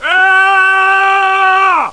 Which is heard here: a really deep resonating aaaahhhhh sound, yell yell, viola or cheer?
yell yell